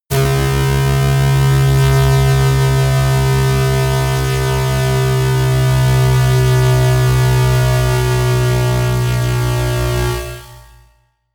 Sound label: bass drop slow long